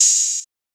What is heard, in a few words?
open hat; FL Studio; xander brown drumkit